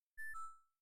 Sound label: alert